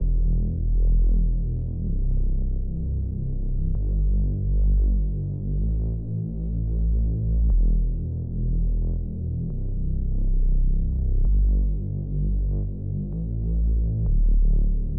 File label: 128 BPM E Min Reese; House; Loop Stems; Cymatics; Breakdown Loops; Goliath